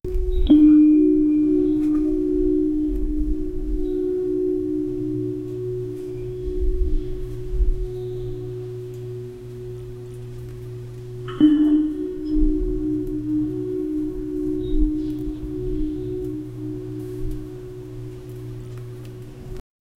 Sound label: generate temple ambiance, bell